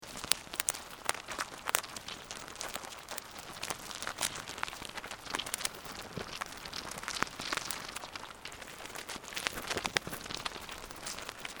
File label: Ants, Italian Alps